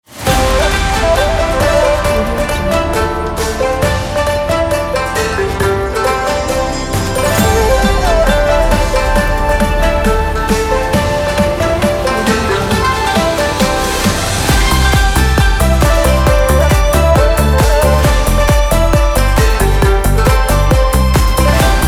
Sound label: chinese new year modern ident